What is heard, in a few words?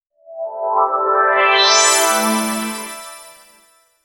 gentle modern intro for a, corporate